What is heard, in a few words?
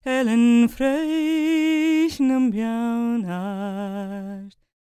GAELIC, World Voices, GAELIC FEM